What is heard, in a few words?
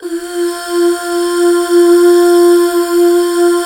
UUHH F4; Best Service Gigapack 1